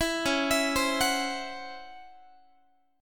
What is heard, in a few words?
C sharp Minor Major 7th Double Flat 5th, Guitar; C#mM7bb5; arpeggiated; JGuitar